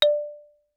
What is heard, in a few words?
Xylophone hard rubber ff D5 stereo; MIS Pitches - 2014; Percussion